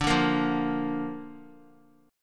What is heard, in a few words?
D Minor Sharp 5th D Guitar; Dm#5 strummed; x,5,2,3,5,x; JGuitar